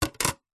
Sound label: household plastic plate set down stack on another; zapsplat